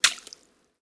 Footsteps Puddle One

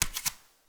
Footsteps Barefoot One

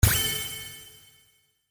impact; ボードゲームやカジノ、麻雀の効果音です。

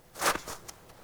footsteps snow

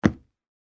footsteps wooden floor; 足音

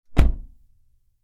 Car Door; 環境音

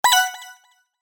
cancel

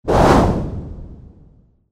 fire arrow; 武器効果音

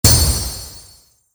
break and chain

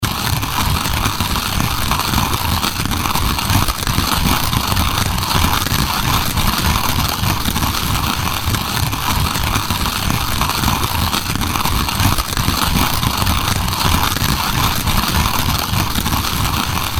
Grind the coffee beans loop

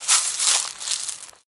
dry leaves footstep; 足音

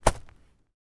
footsteps gravel

